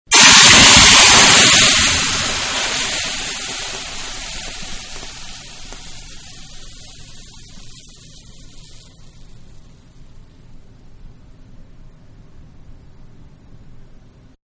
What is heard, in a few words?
Hot Pan Into Cold Water; Hisses; Manhattan Production Music